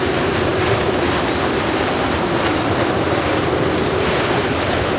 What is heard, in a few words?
wind lp; Microsoft Graphics Studio Greetings; Microsoft 1998